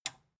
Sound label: metal button tiny release enable